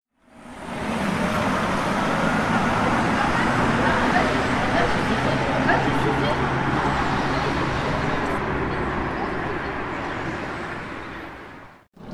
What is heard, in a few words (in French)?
place aux arbres